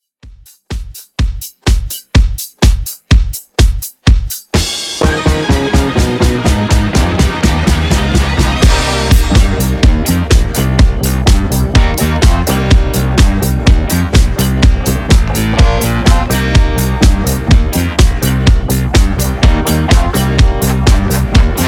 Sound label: Re drum